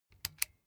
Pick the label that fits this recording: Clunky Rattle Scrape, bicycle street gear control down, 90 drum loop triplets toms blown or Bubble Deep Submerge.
bicycle street gear control down